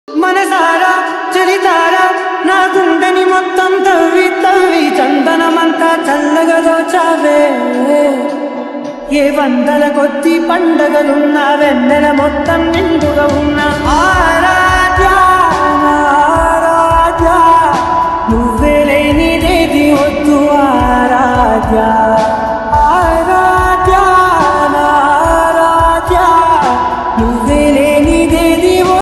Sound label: lofi telugu ringtone